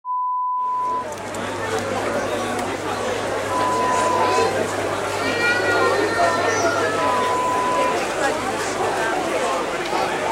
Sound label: Craft Fair sounds